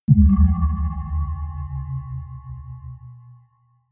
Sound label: sci fi bell dull